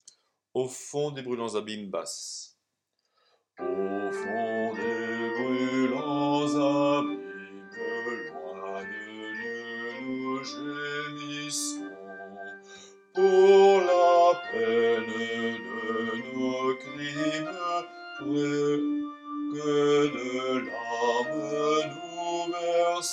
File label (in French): basse